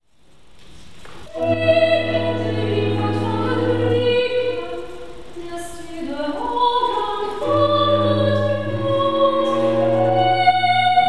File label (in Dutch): recitatief sopraan